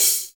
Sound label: HH, REAL